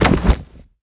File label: ground fall; Rust